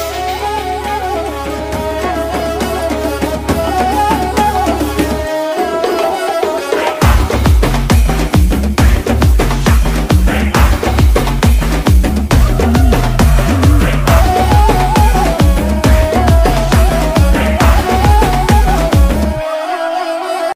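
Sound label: background music ringtone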